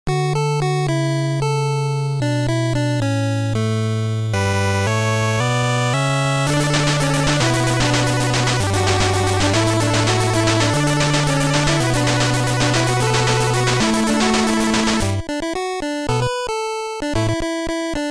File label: 8bit; my little pony